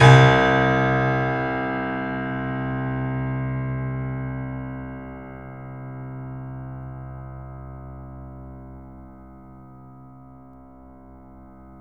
The Piano, sustain

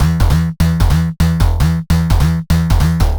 Digga 150 E, future rave samples, 150bpm

